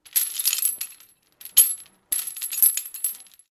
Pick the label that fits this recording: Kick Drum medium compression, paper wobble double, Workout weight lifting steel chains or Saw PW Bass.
Workout weight lifting steel chains